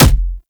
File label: Explosion Punch Generic Medium; Explosion Punch Layers; Weapons